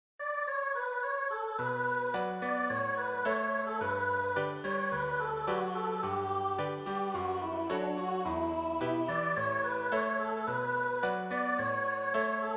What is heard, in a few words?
accompaniment